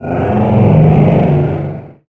ReDead Die

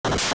Door, Unlocking a dungeon door; AOL

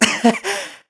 Celebrate; celebrating his Wing Ceremony victory; Link; Skyward Sword

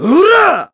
Big Attack; Ghirahim; Skyward Sword

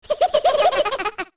Ocarina Laugh

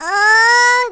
Tetra Struggle; Tetra struggling in the tree

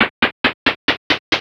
Stairs Up; Walking up and down stairs; Zelda

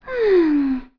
Sigh; Rosa Sisters thinking/sighing

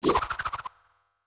Boomerang Throw

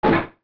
Log Bounce

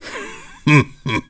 Laugh; Ganondorf laughing (in the Forsaken Fortress)

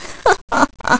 Girl Laugh

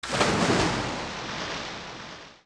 Splash In; Link; Zelda; OOT

